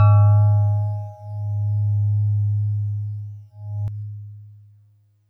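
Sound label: DX100 G sharp 5; David Lynch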